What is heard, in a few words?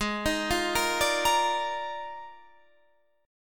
Eb Major 7th Suspended 2nd Suspended 4th